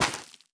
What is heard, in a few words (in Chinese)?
下界疣破坏声